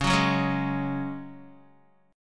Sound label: C sharp Suspended 4th C sharp down Guitar; C#sus4 strummed; JGuitar